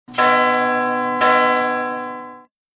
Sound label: Church; آهنگ های زنگ خور موبایل